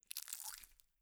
抜く音

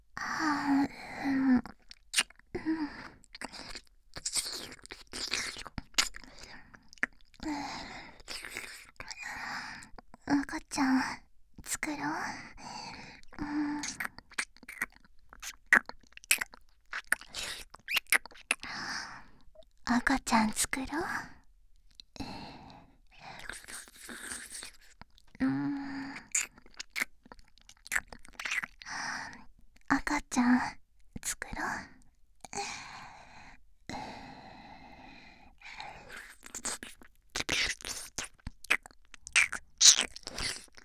耳舐め; 赤ちゃん作ろ♪

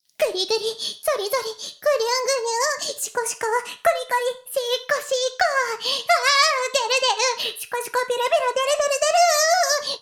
シコシコ音声, ASMR; 古き城のドラゴニート2

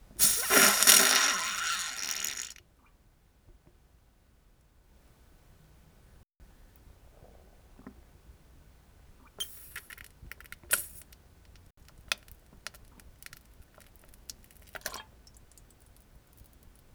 おなら 最後にちょっとなんべん：ぴ ぶぷすーりゅうりゅりりー ぷすぅ （ちゃ・・・た）もも（ちゃ）ももも（ぽちゃ）

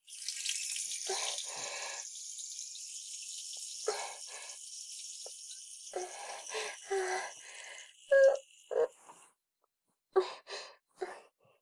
おしっこ トイレ ステレオ

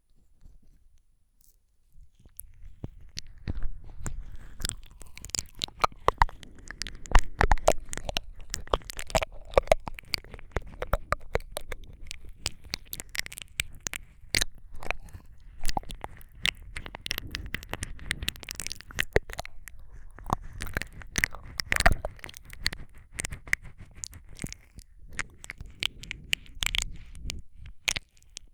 ねっとり両耳舐め, ASMR

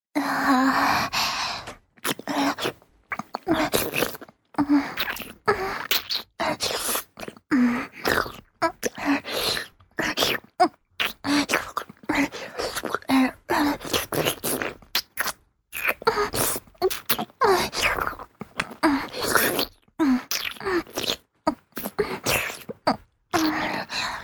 お掃除フェラ 垂れた精液を舐める 中に残っている精液を吸い出す; 実用ボイス抜粋集

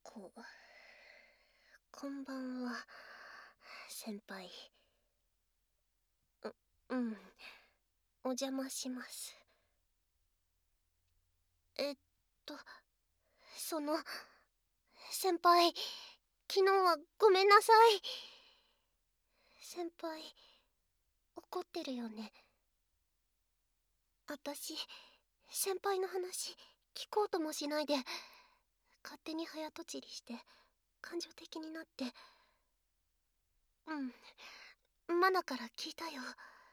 3倍濃厚ディープキス

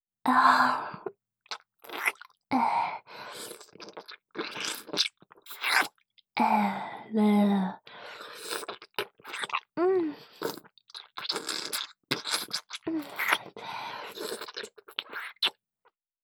ゆっくりめの フェラ; おまけBGV

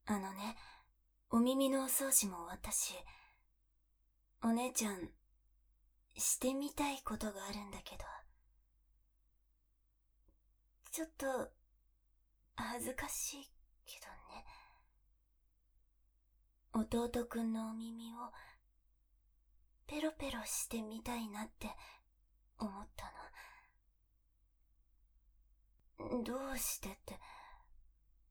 お耳ぺろぺろ えっちな言葉囁く 効果音なし; 弟くんのお耳ぺろぺろして……えっちな言葉囁くから; 甘々でクーデレなお姉ちゃんといっしょ

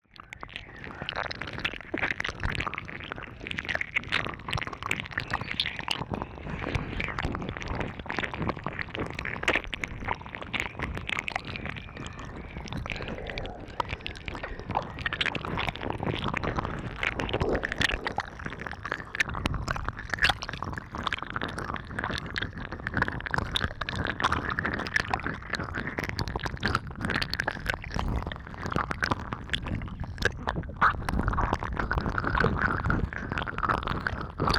耳脳髄舐め, ASMR

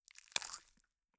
抜く音; 効果音

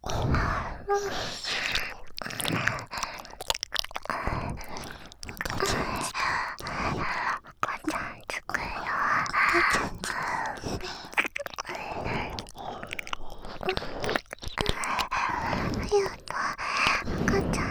赤ちゃん作ろ♪ 耳舐め喘ぎ; 静華

